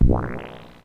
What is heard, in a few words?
Wet